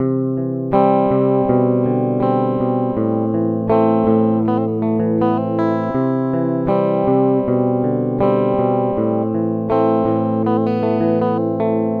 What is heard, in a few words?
tetraplopia fx, doubler; Four-voice stereo doubler.